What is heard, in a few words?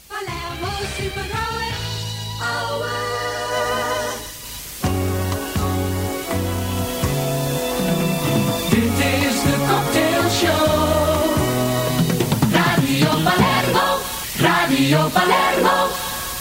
jingles; Palermo